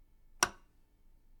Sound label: Lightswitch Turn On